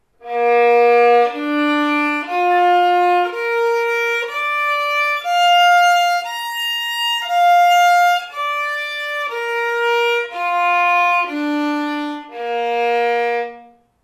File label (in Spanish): si b arpegio; mi primer libro de escalas